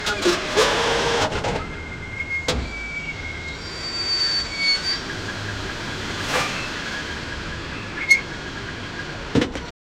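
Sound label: nostalgic warm garage ambience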